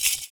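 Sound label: PERC, percussions; Univers Sons - Raricussions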